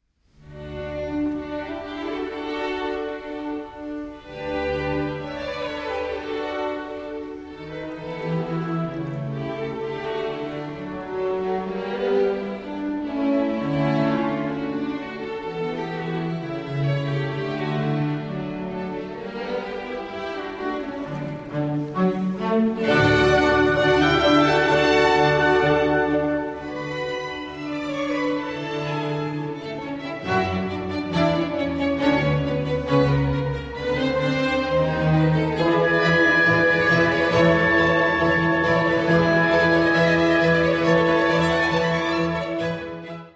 Cello Concerto No. 2 in D Major I. Allegro Moderato (Live)